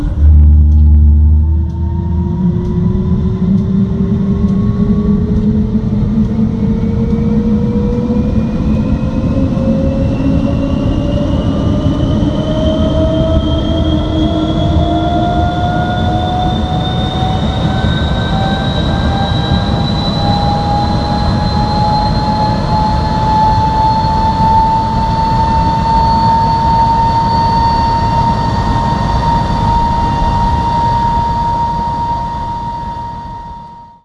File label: startup, DH Vampire